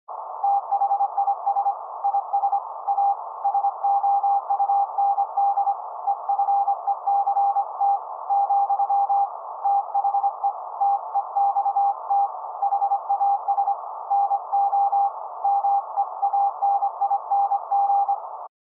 Morse Code Fast Noise

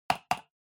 PC Mouse L Hard Double

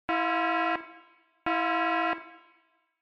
Warning Siren Slow Short

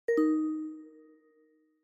OS Sound Notification

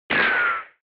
Retro Anime Gun

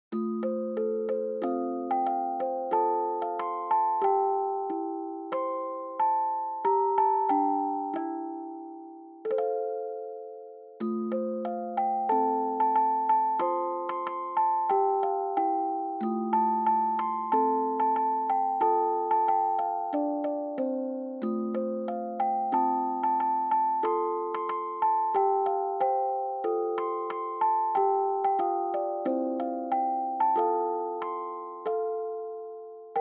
Dry Fast

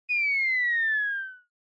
Motion Falling Whistle Short

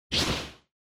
Motion Speed Lines Short; モーション スピード線04-4(短)

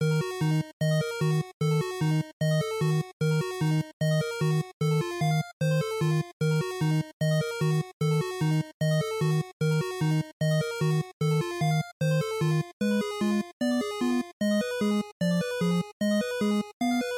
NES RPG Battle Loop150

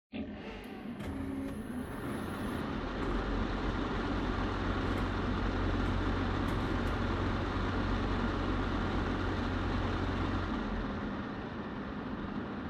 PC Operating Noise On Off

